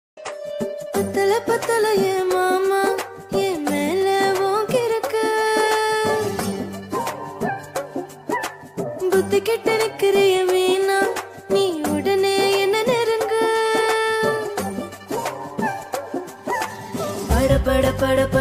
song Tamil version

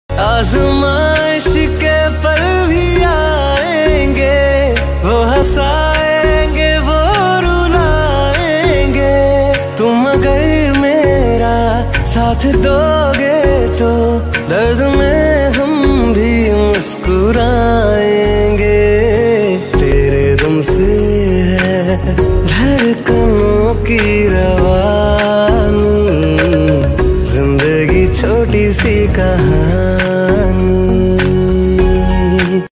ringtone romantic tone

